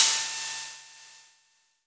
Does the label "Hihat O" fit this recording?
Yes